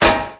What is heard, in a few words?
hit metal